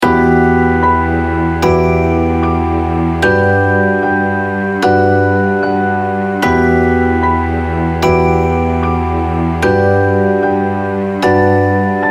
Dark Sad Bounce, piano

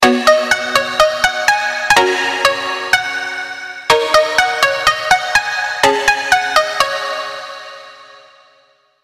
Melody Plucks

